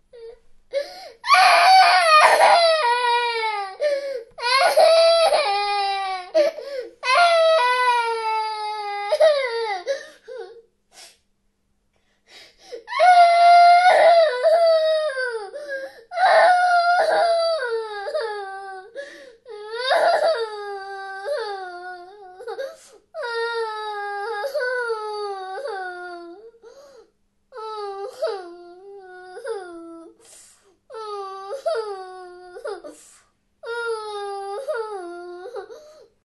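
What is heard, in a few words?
girl crying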